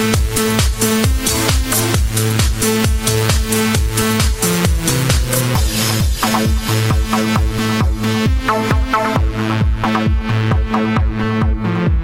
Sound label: House Electro